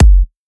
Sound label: EDM Future Kick